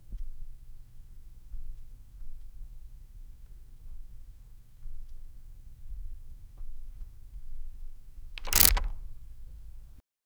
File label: a soft rhythmic shuff shu, room tone